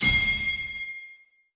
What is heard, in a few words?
Ping; League; OMW